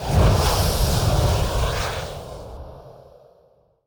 Portal Overfiend Play

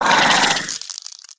Enter Play

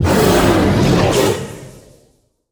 Bone Drake Attack

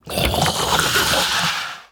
Tentacle

